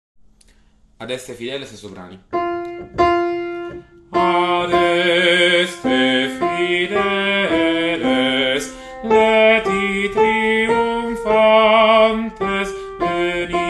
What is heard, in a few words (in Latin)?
Adeste Fideles Soprani